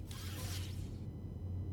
Cell Forcefield On; Trek

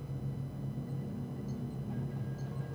Science Lab Sounds; trek

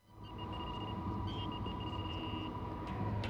Ops Sounds; trek

